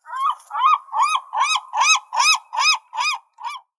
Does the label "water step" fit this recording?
No